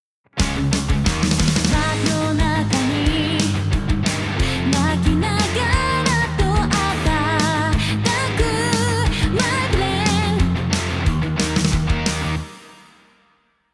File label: double delay rev on